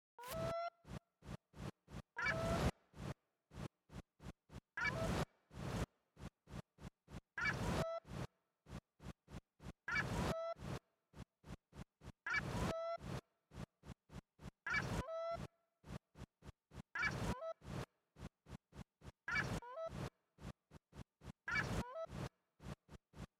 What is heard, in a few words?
Rain animal bird sounds experiment